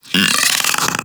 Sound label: Infested Splitter Split Top Vocal Attack Medium; Warframe; Grey Strain